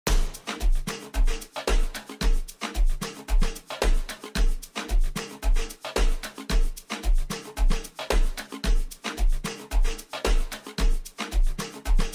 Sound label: Strings and Drums